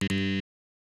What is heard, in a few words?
Buzzer